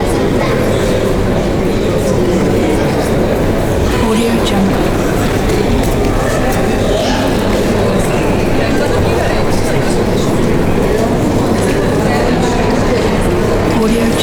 indoor crowd ambience loop

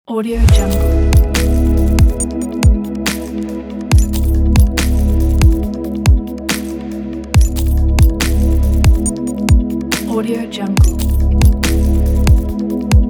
simple ambient

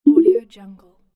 alert

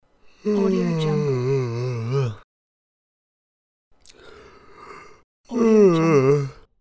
yawning

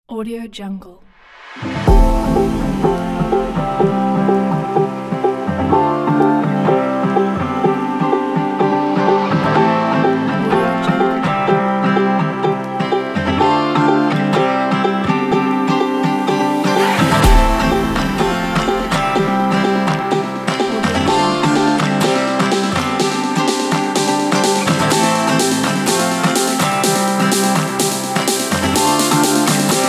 uplifting folk edm

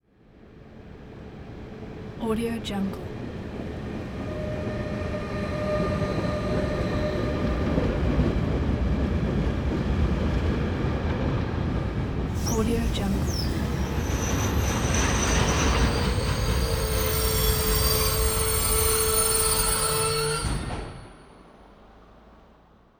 train arrives

